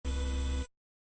robotic arm short; soundscrate

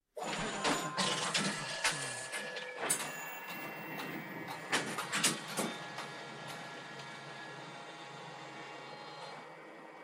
printer printing; soundscrate

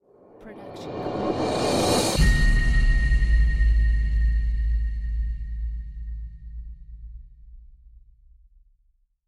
cinematic rise hit; soundscrate

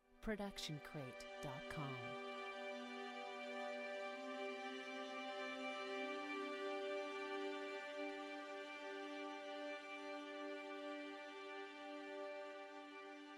optimistic long strings; soundscrate

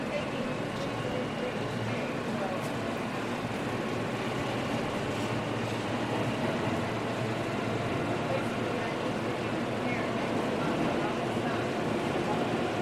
public subway interior; soundscrate

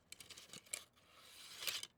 archery foley arrows in quiver

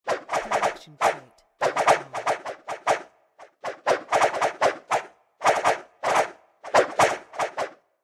arrow swooshes multiple

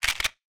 Shotgun Reload

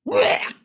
death grunt; SoundsCrate

